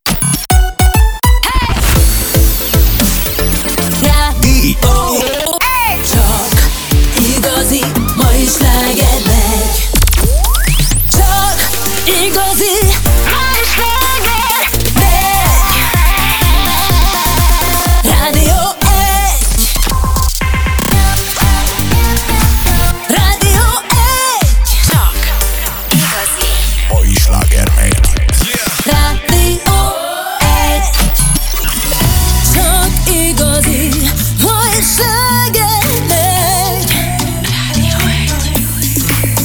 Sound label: RADIO 1 NEW JINGLE; FM 89.5; audio imaging producere; Karlovits Karlo Zsolt